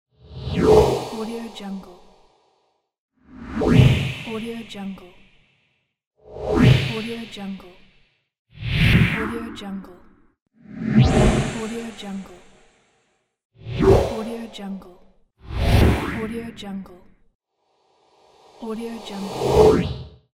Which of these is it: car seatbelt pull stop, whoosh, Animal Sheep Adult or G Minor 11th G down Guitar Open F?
whoosh